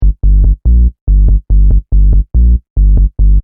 Techno-Trance Sub Bass Loop In G 142 BPM

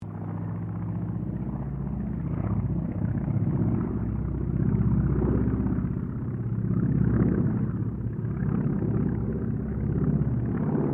AIRCRAFT CERES WINJEEL WIRRAWAY PASS; Mixed aircraft squadrons; Airplanes (Sound Effects:Vehicles)

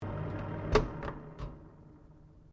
Fan Oven Exhaust Low Shutdown; Fans; Electronics; Various fans

